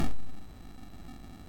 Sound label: sid MOS6581R2, GAMES; Face Off